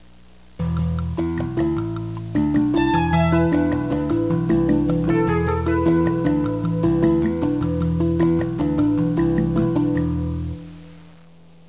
musica newage

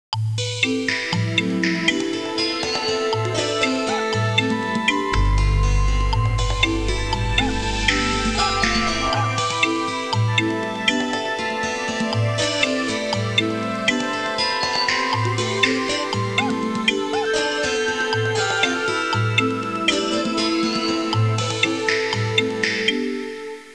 musica elettronica

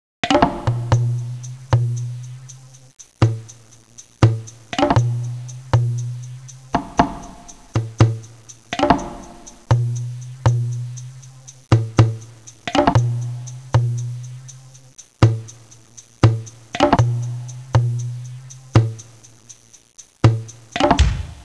suonerie musica ritmica

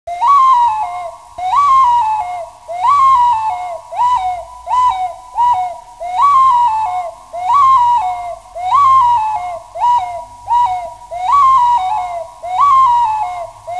musica strumentale

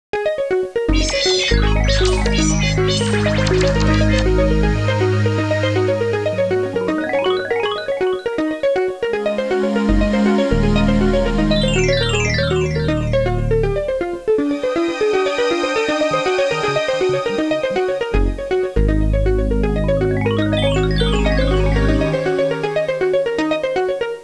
musica elettronica

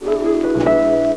musica strumentale

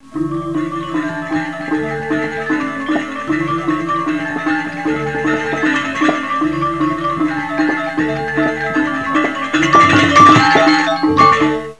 suonerie musica ritmica; rhythm

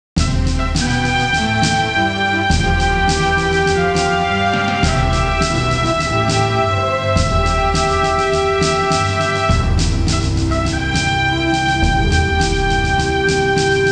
musica sinfonica; symphonic

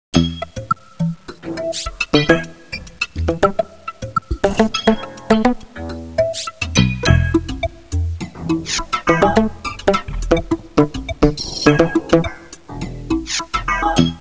musica tecno; techno

